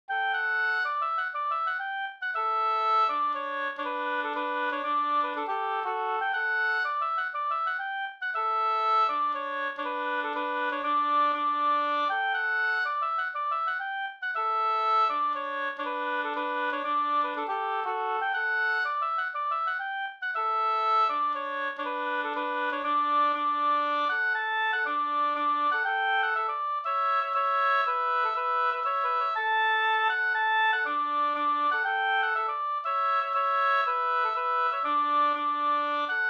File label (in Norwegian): pols Rorospols; Brurleken e Sulhusgubben